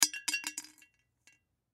Jar Drop Pretzel Inside Bounce Glass; Foley; Food; Household

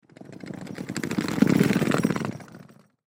Suitcase Pass By Left To Right Airport; Airports; Industry; Blastwave

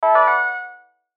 chime musical melody; Chimes; Blastwave

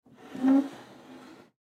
Chair Wood Slide, Chair Slide Light Floor; Offices; Foley; Blastwave

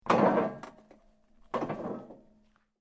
metal rods move

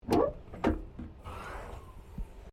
WRECK CAR SMALL HATCH OPEN; Wrecked Cars, impacts, crashes, scrapes; Objects

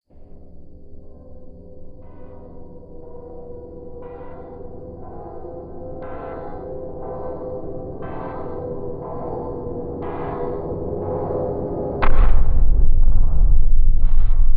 Sinister Horror Impact; miscellaneous impact; Miscellaneous Impact Sound Effects

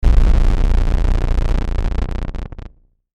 static low hum; Static Low Hum Sweep; Production Element Imaging Element Accent Transition; Blastwave; Static Sound Effects

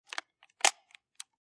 Pistol Revolver Chamber Closed; Gun Handling

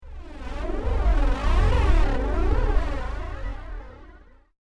Drone Mysterious Whoosh; whoosh sound effect

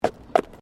Tram Horse Drawn Horse Step Gravel; Horse drawn tramcar; Carriages (Sound Effects:Vehicles)

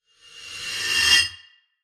Fast Metal Transition; Scary Soundscapes; Horror; Crafted Signals

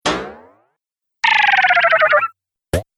Videogame Fx; Sound Pack 10; Video Game Sound Effects

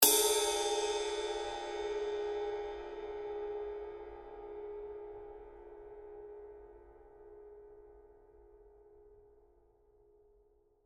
Cymbal Suspended; Musical

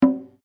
Drum Taiko Shimea, small Japanese Taiko drum; Musical; Miscellaneous Musical

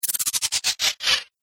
Android Power Down, robot

